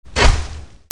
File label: SCIFI